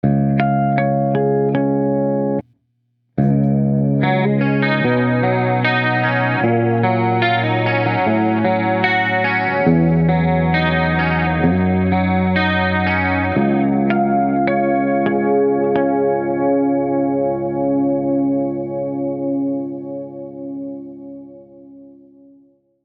MXR Black Label Chorus Intro PRS SE Custom Bogner Ecstasy; Bogner 20th Anniversary Ecstasy